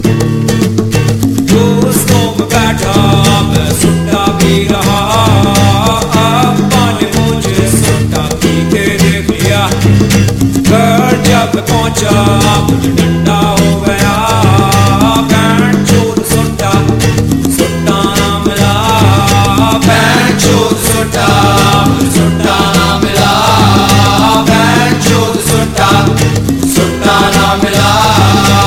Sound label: Hindi Rap